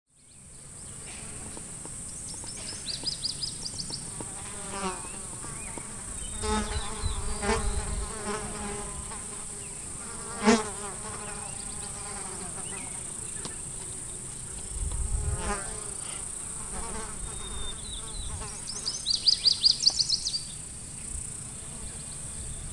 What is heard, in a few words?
Buzzin Flies Very Near; Montana 2013